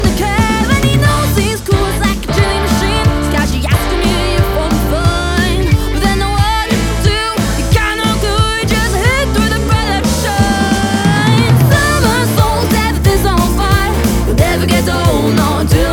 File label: Somersault, bass gitarre; MiaRose; zuerich switzerland